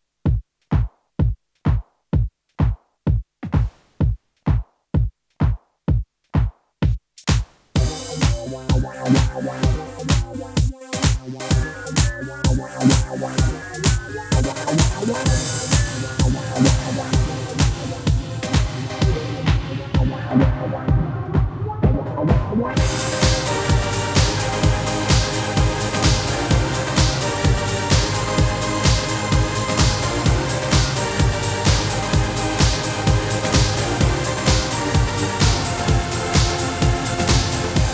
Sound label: PSR-S670 DJ Style